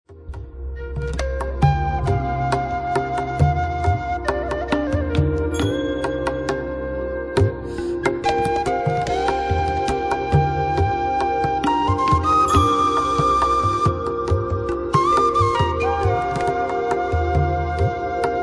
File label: drum source